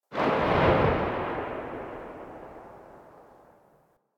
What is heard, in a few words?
Glass Shotgun Tail C; Warframe; Tenno; Weapons